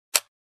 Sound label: Gun trigger